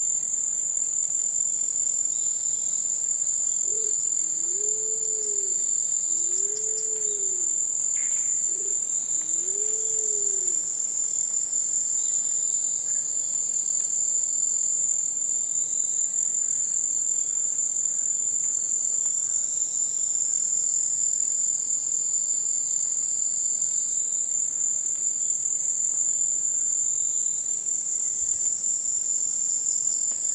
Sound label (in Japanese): kuroiwazemi